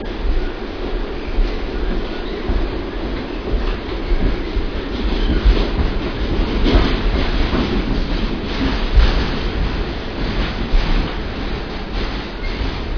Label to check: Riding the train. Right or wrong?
Right